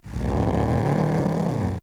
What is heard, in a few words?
Kavat Vocal Idle; Warframe; Khora